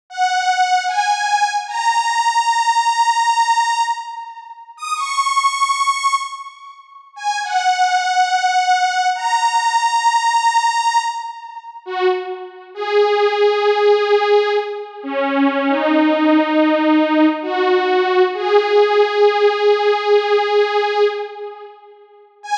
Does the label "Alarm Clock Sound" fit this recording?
Yes